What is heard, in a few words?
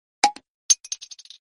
samsung sms alert